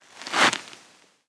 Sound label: make chariots; Immortal